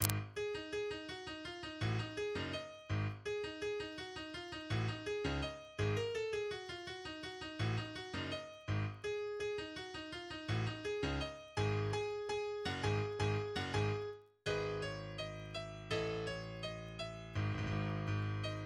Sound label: Piano